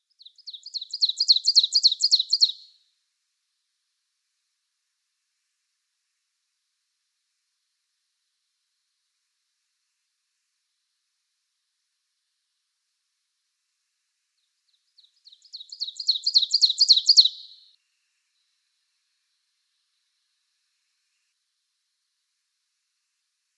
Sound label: Northern Waterthrush song